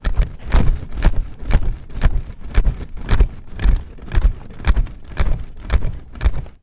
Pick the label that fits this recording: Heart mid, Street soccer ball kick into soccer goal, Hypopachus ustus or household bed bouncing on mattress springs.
household bed bouncing on mattress springs